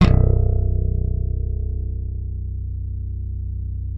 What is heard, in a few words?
thumb B0, slap thumb; Xsample VOL-1; 90 s Sample CDs